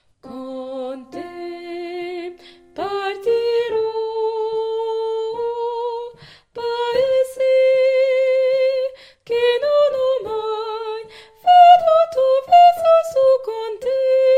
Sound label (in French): Con te, tenor refrain